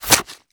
Sword Shell, Damage

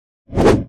axe; Weapon

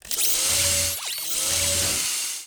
peace idle; HOBOSWORD

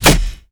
Mace Shell, Damage

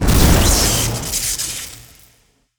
Electric Damage; bullet

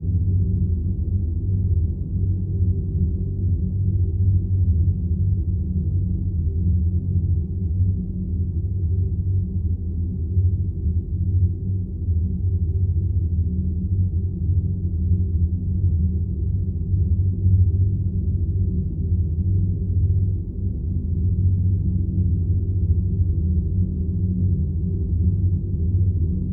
Cave Wind, ambience

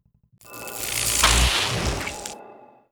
mechanic sweep

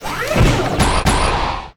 catapult combat attack

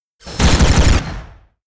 war attack; HOBOMITE

